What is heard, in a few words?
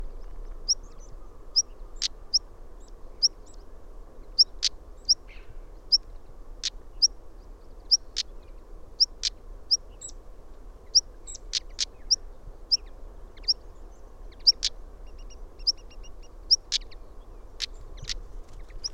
European Stonechat Saxicola rubicola